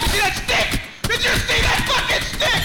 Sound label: screaming